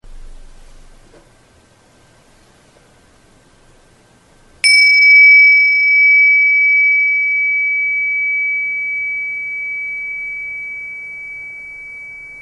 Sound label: Gong; Dietz Training